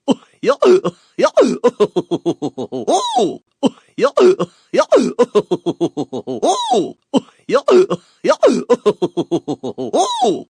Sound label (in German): lachen ringtone